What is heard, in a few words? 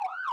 samu, sirene